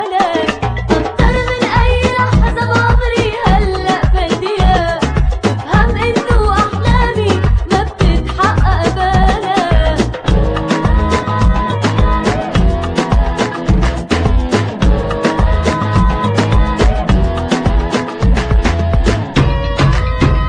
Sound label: soft arabic music ringtone